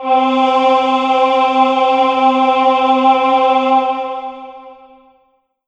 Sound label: Korg Triton Slow Choir ST C4